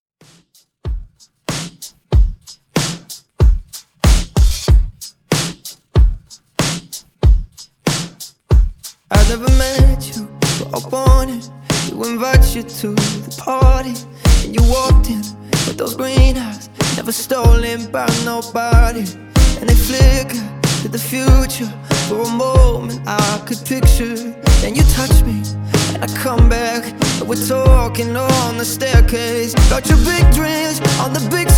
Re drum

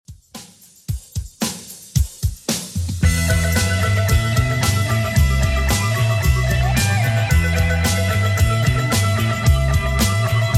80s Redrum 112bpm

